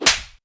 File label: slap, impact flesh; DoomRTX